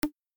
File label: popup; reactos